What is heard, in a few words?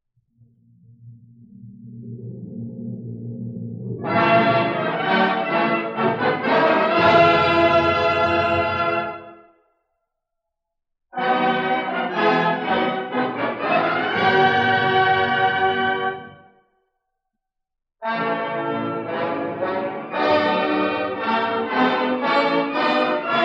Fanfare